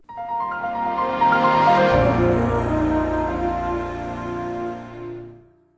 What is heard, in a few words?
Startup; Windows Never Released Soundpack 1; deviantart